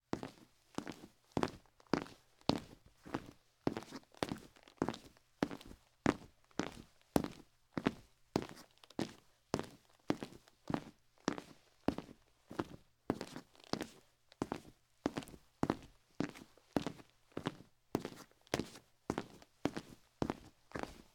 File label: sneaker plastic floor mat footstep walking; sonniss gdc